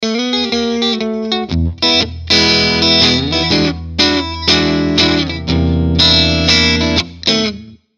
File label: Single coil; Fame Forum IV